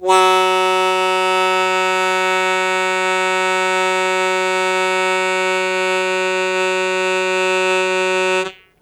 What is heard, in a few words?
Loud Attack Sustain F#0, Harmonica; Zero G - Harmonica